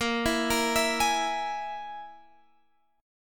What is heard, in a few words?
Ab Suspended 2nd Sharp 5th Bb